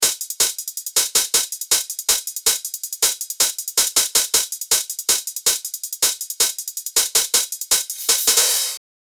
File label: hypertrap open hats, drums